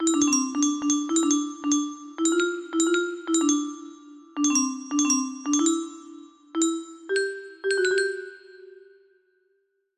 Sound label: music box